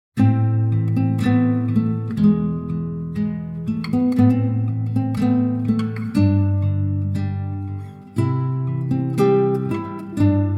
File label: HYBRID KING CLASSICAL GUITAR; Theme declinated in totally different variations and instrumentations